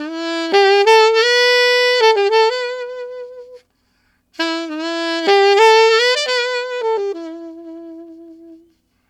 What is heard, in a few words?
Alto Rock in E min, Sax; Sonic Foundry (Sony Creative Software)